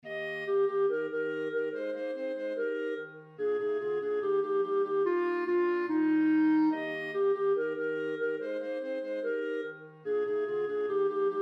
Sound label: Christmas is coming Soprano, choir